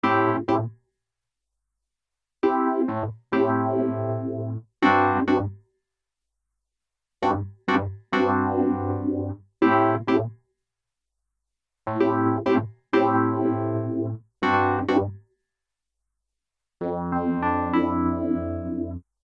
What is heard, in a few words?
Wurly dry; Presonus Studiolive ar22 usb